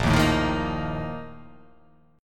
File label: Bb Major 7th Suspended 4th, chord